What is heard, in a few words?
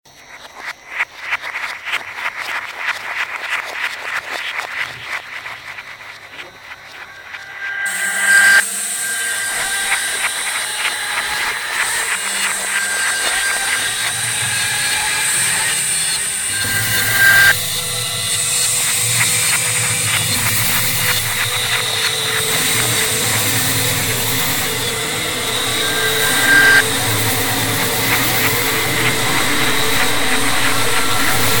song of birds